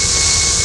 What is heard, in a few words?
Plasma Travel Loop